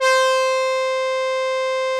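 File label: Juno brass, analog synths; 90s Sample CDs; Club-50 - Foundations Roland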